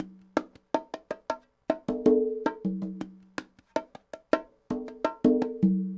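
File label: congas bongos 80 bpm, latin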